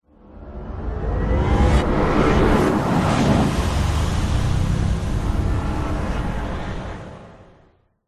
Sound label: futuristic vehicle passby animation particle swish swoosh accent logo sci fi futuristic; alien